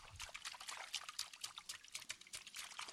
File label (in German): Wasser planschen lang; Geräusche zu den Klangexperimenten